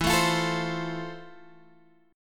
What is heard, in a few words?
F Major